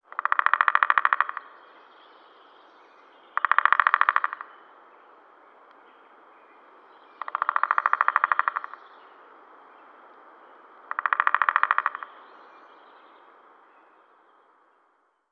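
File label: animal bird distant woodpecker; modern warfare 2; amb emitters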